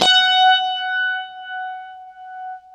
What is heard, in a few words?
UNIVIB F#4, Guitar; East West; Steve Stevens Guitar Samples Collection